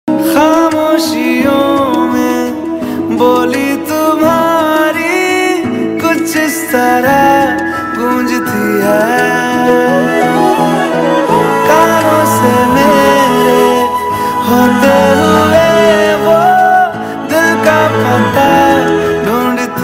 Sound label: mobile phone ringtone